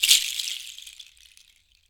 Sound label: PODS, ETHNIC PERCUSSION; AKAI S6000 CD-ROM - Volume 5